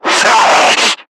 Screamer Pain; Warframe; Lasrian

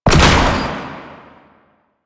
Ranged Weapon Fire; Warframe; Necramech

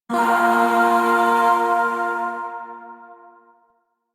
Shawzin Chromatic Chord K; Chords; Warframe; Zariman; Void

